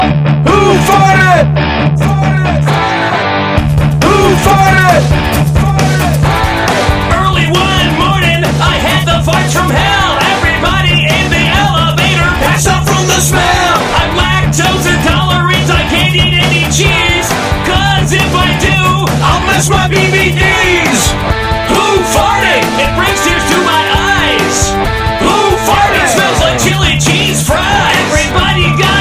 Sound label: Who Farted